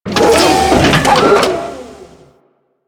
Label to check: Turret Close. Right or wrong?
Right